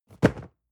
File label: cardboard box medium fall low hard; objekte